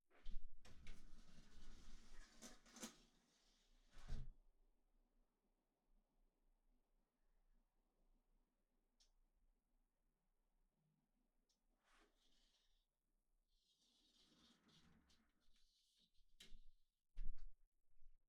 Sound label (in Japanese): 窓開閉; 3DIO; 色々な動作